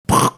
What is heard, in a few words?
making sound light going out